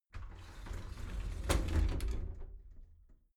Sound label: shower slide door inside close soft halfway